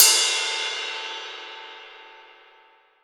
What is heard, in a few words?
ZIL, 20 ZIL RIDE